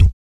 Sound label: VOC PERC, Vocal Planet Jazz FX BASS PERC